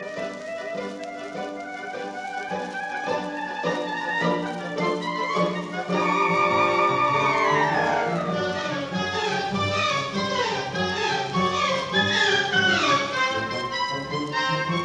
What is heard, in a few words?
Symphony No 9 in E flat major Presto; Shostakovich; Op 70; Boston Symphony Orchestra